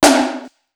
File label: SDS2000 HT, Simmons SDS2000; SDS2000 Factory5